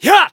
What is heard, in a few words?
attack, jp